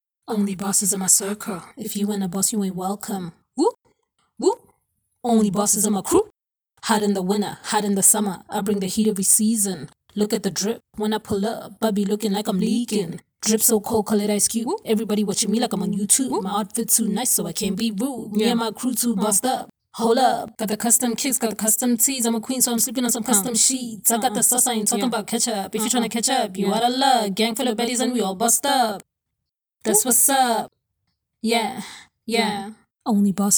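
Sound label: hip hop, 78, B minor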